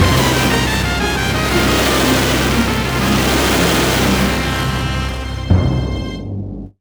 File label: Crashlanding; Diddy Kong Racing DS; Wizpigs